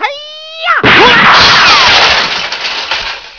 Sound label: kick